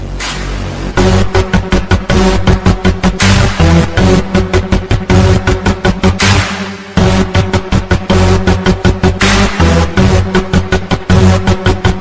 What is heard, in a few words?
cool english ringtone